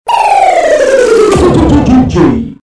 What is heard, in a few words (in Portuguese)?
vinheta do dj com efeito estudio